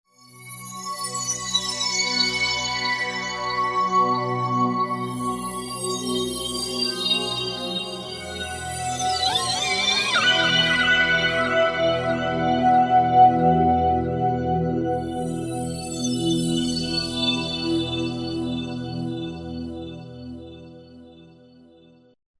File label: Alias Pad, Roland V-Synth; Factory Patches